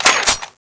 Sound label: gatling bolt pull, weapons; gatlingex; cstrike